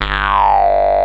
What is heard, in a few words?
MOUTH ARP G1; Big Fish Audio; Synth City